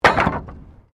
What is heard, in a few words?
METAL SPIKE FALL; Materials